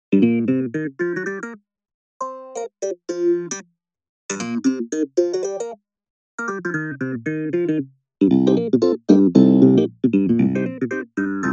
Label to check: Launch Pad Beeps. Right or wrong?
Wrong